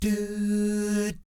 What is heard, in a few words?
DOO, Vocal Planet Jazz